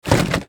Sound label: Rattle; Rei Yumesaki